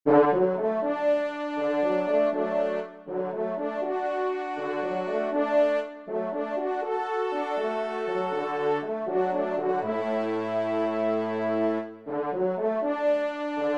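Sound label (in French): ensemble, trompe cors; Rolle; Le Vigoureux; Emile Rolle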